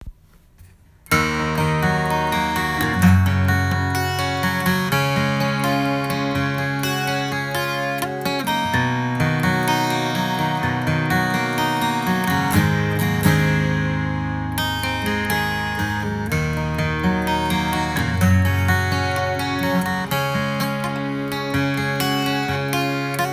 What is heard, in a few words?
Martin GJ12-40 strum